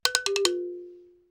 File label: Bug, notifications; Elephone U Pro